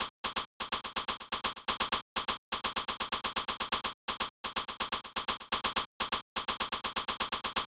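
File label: Chrom Clav; Club Beat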